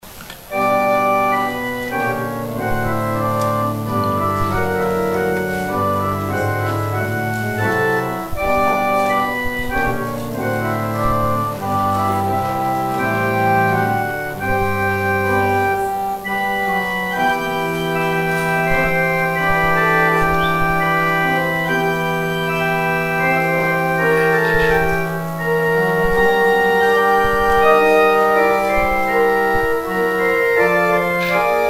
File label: organ interlude; Interlude during the offering